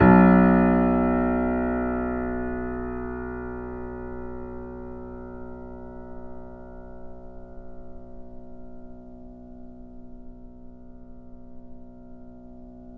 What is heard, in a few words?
Mp B 2 off, Grand Piano off mic; AKAI S6000 CD-ROM - Volume 2